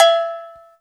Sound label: COWBELL, Ragga & Dub Reggae, BELLS KIT; AKAI; USB Soundscan vol.27